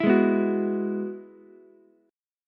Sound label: Ab Minor 7th Sharp 5th Ab, Ukulele; strummed; 9,8,7,9